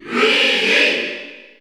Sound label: Cheer Spanish PAL; Luigi; SSBU